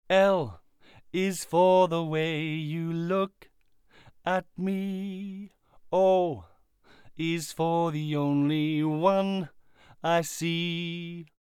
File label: Off Axis Tone Omni; Recording Mistakes